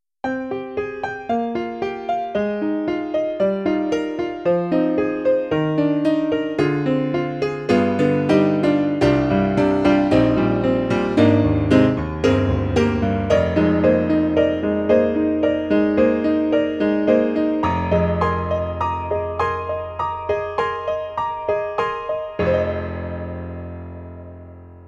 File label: Jazz Piano; SoundFont2